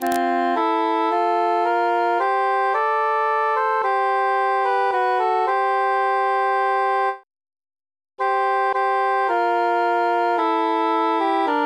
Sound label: tenor mute; ther is no rose